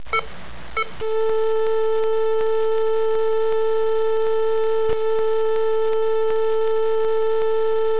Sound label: monitor flatline; Motorola MPX200